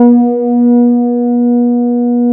MOOG B4, synthesizer bass; ESQ 1

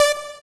SYN Poly D; Best Service Gigapack 1

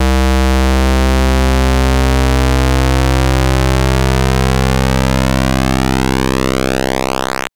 Synth PulseSweep c1; TrashLibrary

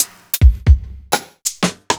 Clavia Nord Modular Kit

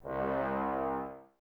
Brass, Woodwind Brass Ensemble

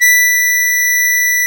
HARMONICA

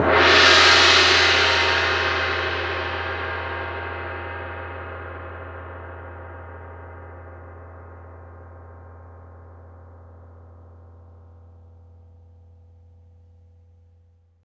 CYM LRG, cymbals; Roland LA; CYMBALS TAMS

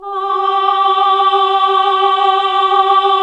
HAL AAH, Nuns Aahhh

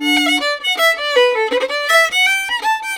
violin, Celtic Flavours; USB Soundscan vol.42; AKAI

